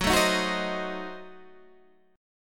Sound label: Gb Diminished 7th Guitar Standard; strummed; JGuitar